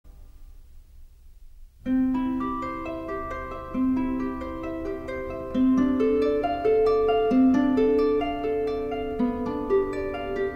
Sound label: Ave Maria (harp solo version); Gounod, Charles